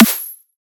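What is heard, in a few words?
drum and bass Snare; Chainz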